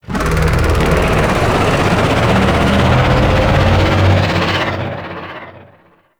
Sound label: Void Dragon Vocal Idle; Warframe; Duviri